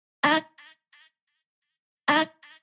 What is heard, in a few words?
vokal aaak